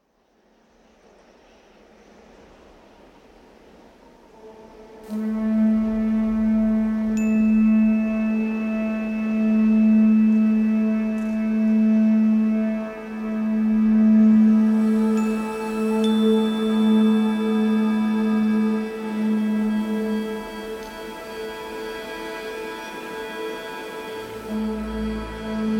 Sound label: Tibetan Flutes & Bowls